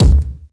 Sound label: kick d key, bass drum one shot tuned to the D note. Loudest frequency: 443Hz